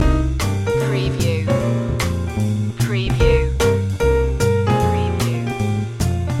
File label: whats up loop